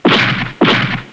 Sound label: giant run; eqclassic